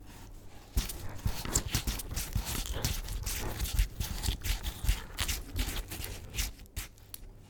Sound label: Animal Eating Food Licking